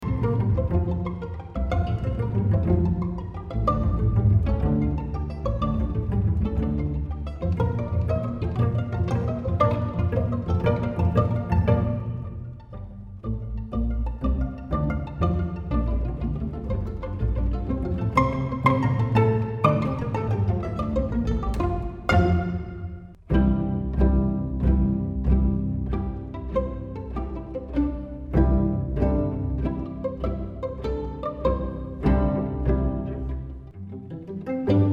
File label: simple symphony for strings, pizzicato; BRITTEN